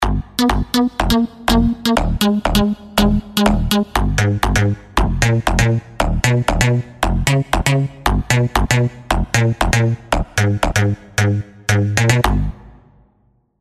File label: PERC BASS